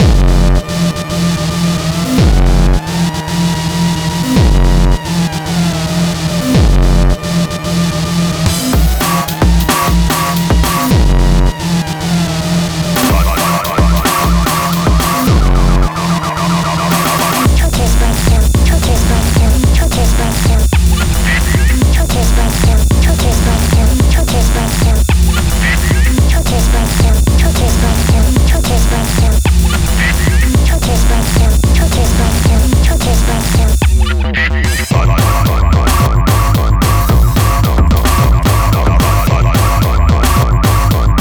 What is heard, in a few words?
Hardcore; dopamix 3ds gamerip 2012